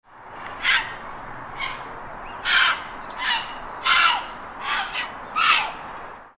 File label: grey heron